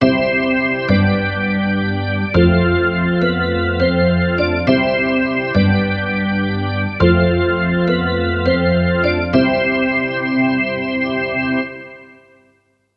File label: organ